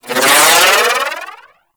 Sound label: Arch Wing Prime Dodge Roll; Movement; Warframe; Vehicles